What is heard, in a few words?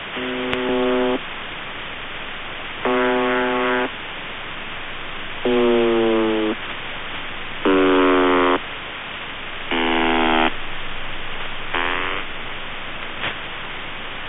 Buzzer broken horn; USB + Carrier; 4625; S28